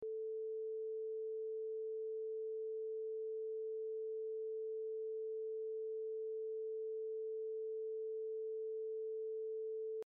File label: 440Hz 0dBfs